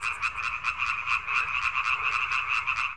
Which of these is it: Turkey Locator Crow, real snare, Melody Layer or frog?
frog